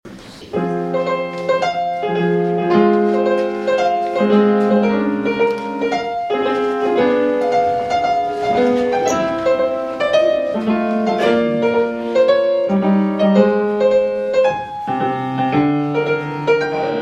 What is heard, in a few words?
chorus; Messiah; Surely He hath borne